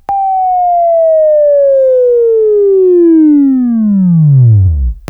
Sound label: Buzz; Labview-Program; Sweeping Training program